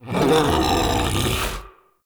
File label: Battle Dog Death; Animals; Vocals